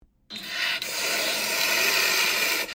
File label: floppa hissing